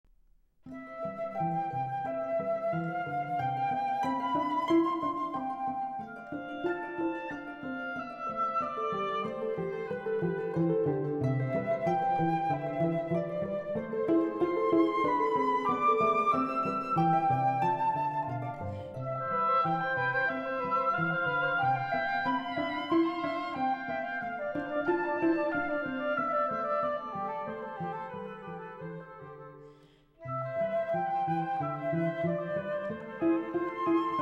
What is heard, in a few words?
quartet for flute oboe cello